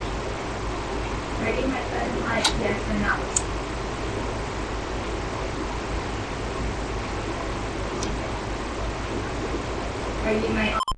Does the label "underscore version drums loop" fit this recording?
No